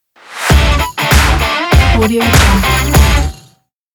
sport logo

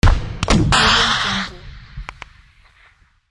shooting from rpg

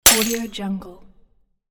glass breaking

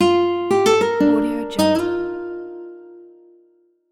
acoustic guitar melody; backgroundsounds

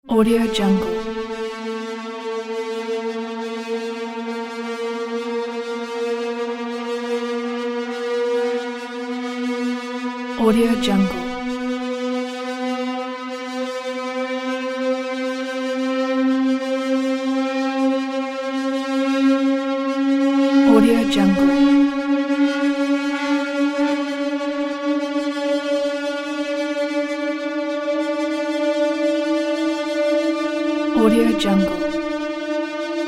massive trailer riser

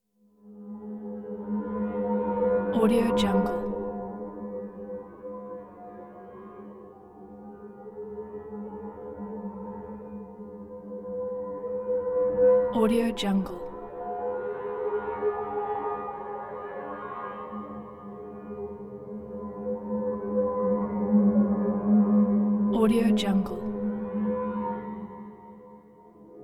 eerie tone metallic swirling tone tubular; prosoundfx

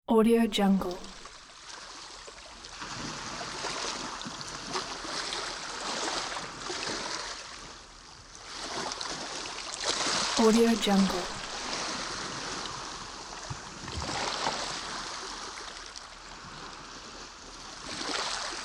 gentle sea waves

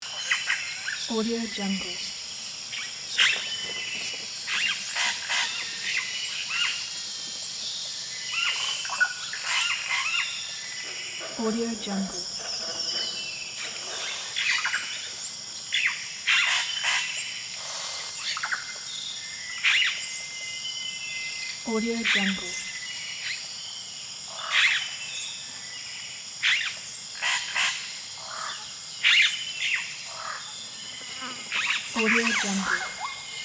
jungle ambience loop

